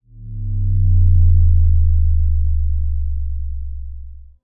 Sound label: Clean Bass Bend